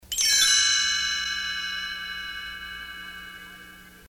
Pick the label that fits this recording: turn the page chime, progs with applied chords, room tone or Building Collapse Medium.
turn the page chime